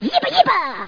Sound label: HEALTH; gubble